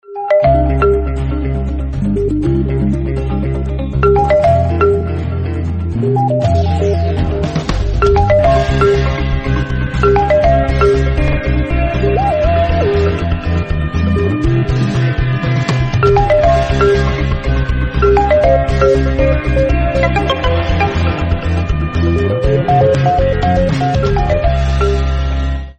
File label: Vivo X100 Ringtone